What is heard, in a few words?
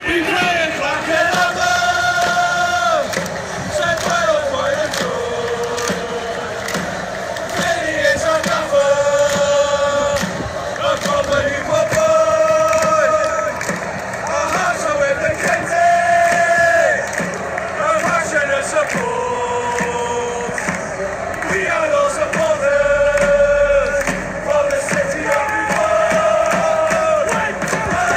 allez allez allez, fanchants